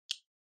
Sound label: Smartphone Lock Unlock Speaker